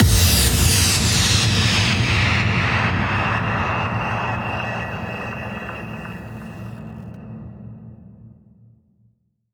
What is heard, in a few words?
FX Impact